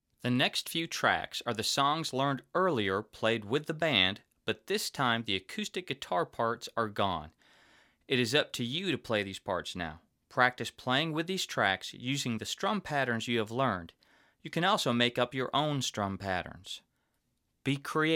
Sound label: Minor Changes